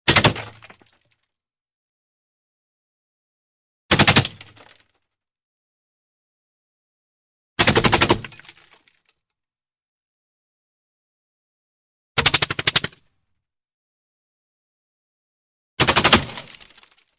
impact bullet metal; VAPEN; KULTRÄFFAR

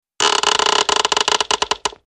cartoon squeek; TECKNAT

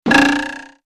cartoon bounce; TECKNAT

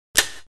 button, knappar på - av-ljud

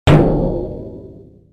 cartoon drum; TECKNAT

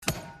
Clic; KNAPPAR; FJÄDERLJUD

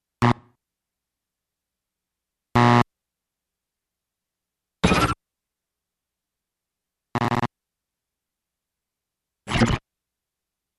noise AM

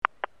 Clic; KNAPPAR; FJÄDERLJUD

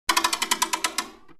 lotto wheel, NÖJESFÄLT LOTTERIHJUL

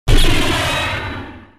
gun; VAPEN; KRINGLJUD

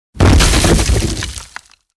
impact wood; BRYTLJUD; TRÄ

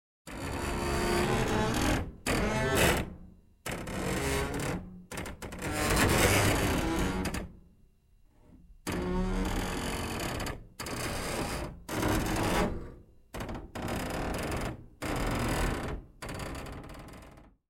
creak metal; METALL; KNARR

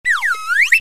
cartoon slide; TECKNAT

